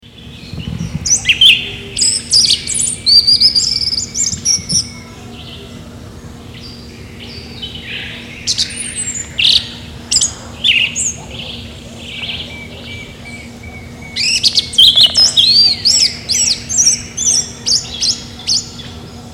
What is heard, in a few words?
Turdus flavipes; Cantareira S Park; Brazil Silveira